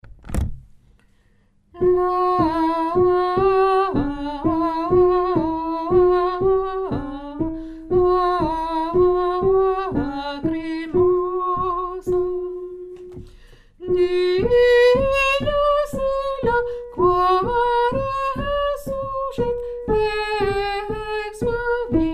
lettre A soprano, chorale

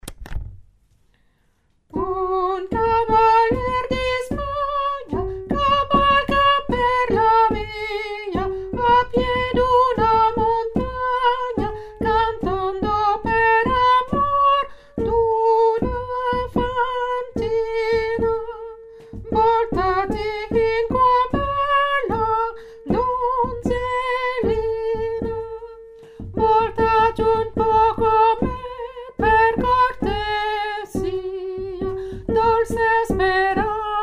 cavalier dispagna tenor

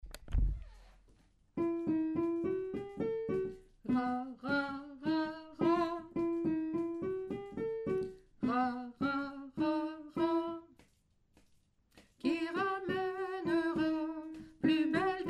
le tango alto, choeur; tango du rat

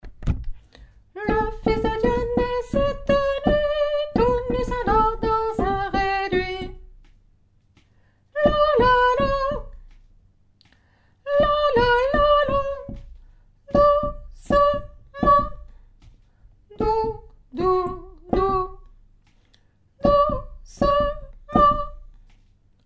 l'enfant dort tenor; nouveaux noels; chants noel

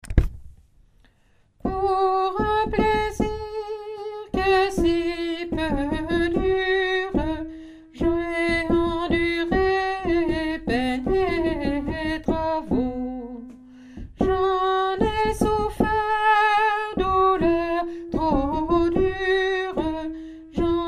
pour ung plaisir alto

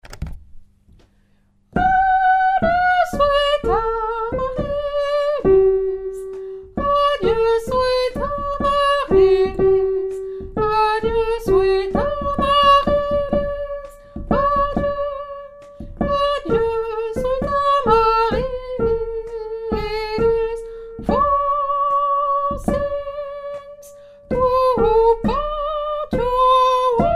tenor, chorale; sweet amarillis; repetition agen septembre 2024

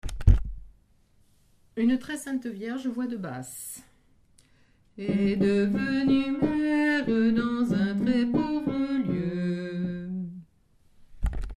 basse; une tres sainte vierge

